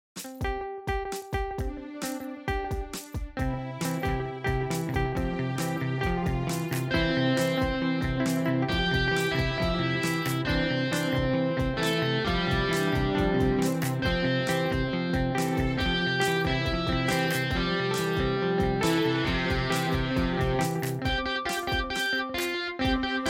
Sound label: Rock; Barbie